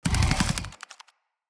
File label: Infested Big Ashen Move Turn